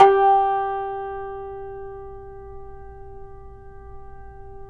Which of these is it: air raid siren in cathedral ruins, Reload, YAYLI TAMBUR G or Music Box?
YAYLI TAMBUR G